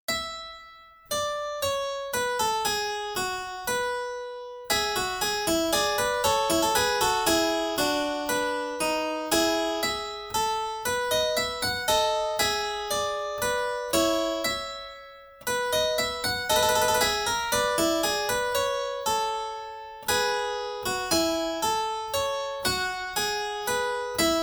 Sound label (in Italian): Fuga All Unisono; Harmonie Beethoven